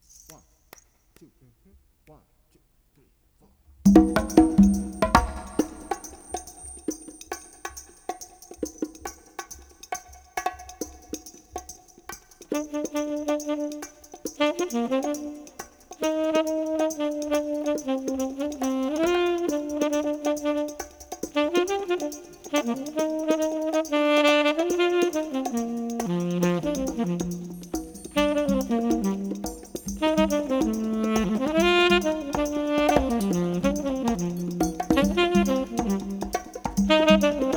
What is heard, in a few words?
Jingle Bells